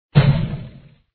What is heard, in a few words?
attack contact; okkultist